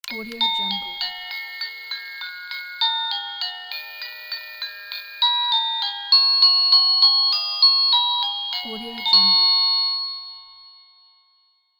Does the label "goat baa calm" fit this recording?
No